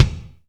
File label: Reverb Kick Snare; PS-37 Dance Synths; Percussion